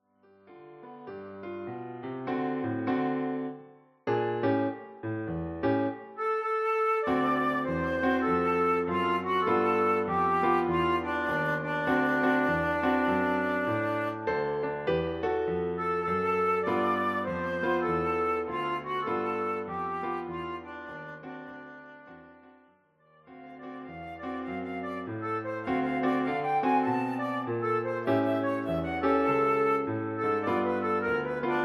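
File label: flute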